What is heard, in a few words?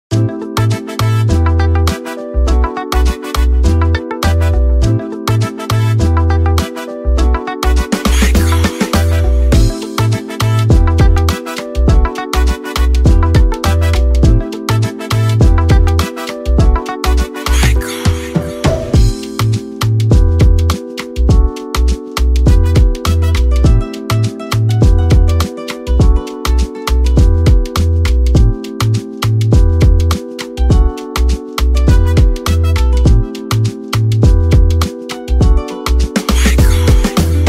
Afrobeat Instrumental